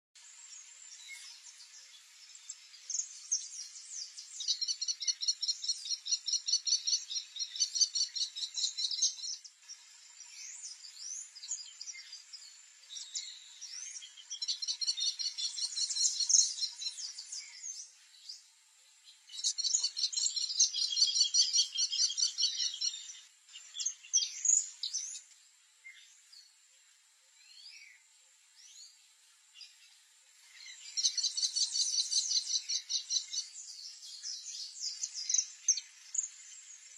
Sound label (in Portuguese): canto do falcao de galapagos